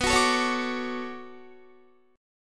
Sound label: B-Minor 9th B down Guitar; Bm9 strummed